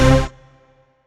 Dance Mega Synth; Best Service; 90 s Sample CDs

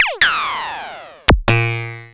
Korg Monopoly, Samples Sliced; m8

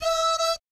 DOO DOOT, Vocal Planet Jazz; ILIO; Jazz & FX; JAZZCOMBO

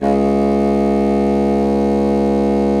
BASSOON C#2; Xsample VOL-6

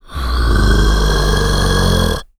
GROWL, VOCAL FX; Spectrasonics Vocal Planet; Jazz FX

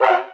DOGGY, Anime Sound FX; COMIC

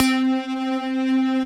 C Synth, One Shots

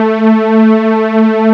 PAD WARM, Nord

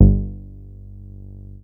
G2, BASS; Q Up Arts; Dream Experience; BASS BANK 7

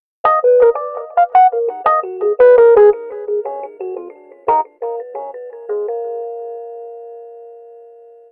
Rhodes, Cinematic Funk; Sonic Foundry (Sony Creative Software); Cinematic Funk Urban Tone Poems

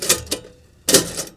TOASTER

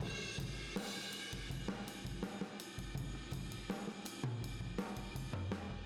Boogaloo Fill Room M, 82 BPM, Drums; Sonic Foundry (Sony Creative Software) - Drums from the Big Room The Mixes

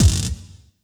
BD, Drum & Bass, 165 BPM; Ueberschall; Drum & Bass Resonance

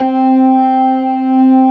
TARK, organ combos

